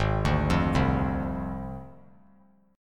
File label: Ab 6th Flat 5th Ab; arpeggiated; JGuitar